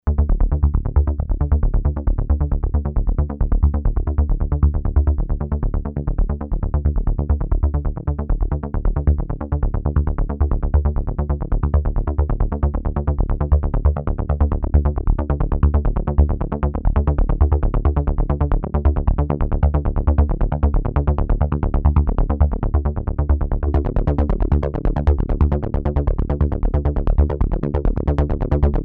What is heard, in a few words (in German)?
Roehre